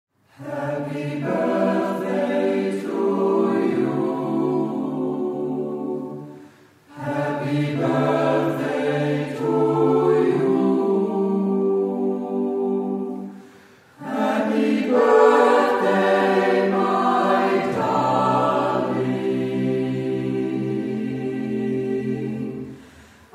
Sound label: Happy Birthday My Darling